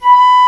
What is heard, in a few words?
Flute mf; Multisamples; Digital Sound Factory Virtuoso